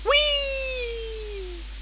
weee

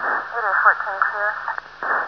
police

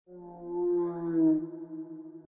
Dino Distant; docks

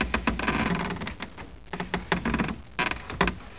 steps; cs poltergeist

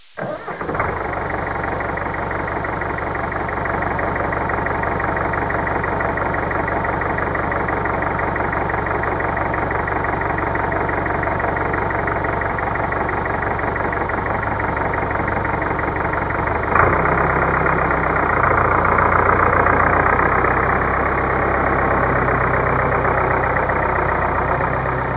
tractor; csde attic

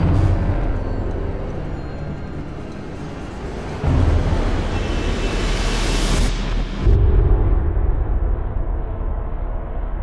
music

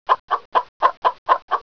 clucking

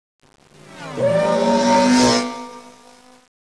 speeder flyby; atat

